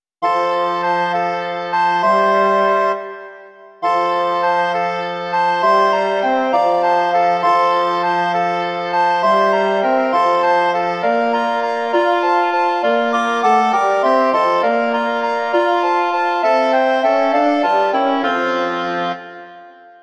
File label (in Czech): 2 zobcové flétny, hoboj a lesní roh; Přišlo jsi k nám, Jezulátko; Imaginativní verze